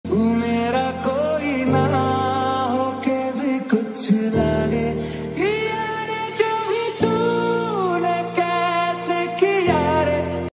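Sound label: hindi romantic ringtone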